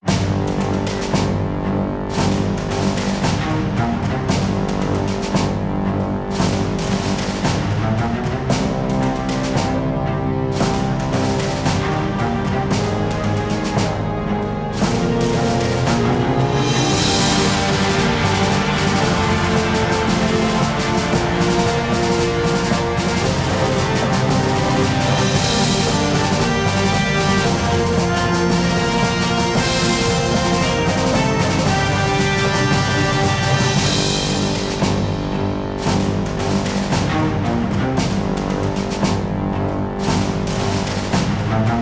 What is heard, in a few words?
Jingle Boss; Taiko no Tatsujin V Version; PS Vita